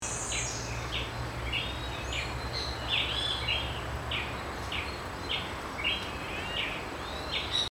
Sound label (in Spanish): frute coro, Tachyponus coronatus, Ruby-crowned Tanager; Frutero Coronado